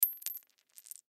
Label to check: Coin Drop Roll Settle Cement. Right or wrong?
Right